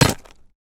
pickaxe hit

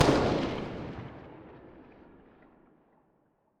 fn fal rev; weapons

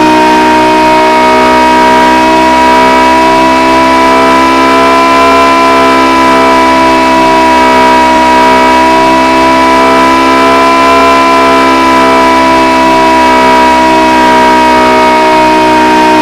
high, engines; BMW M6

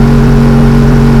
low, engines; BMW M5

